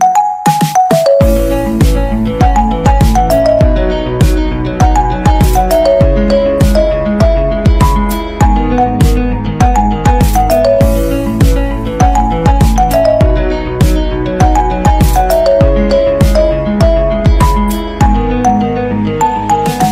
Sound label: IPHONE MUSIC RINGTONE